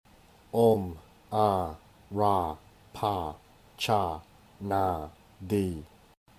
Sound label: Mañjuśrī Mantra